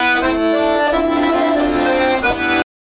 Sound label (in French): Pont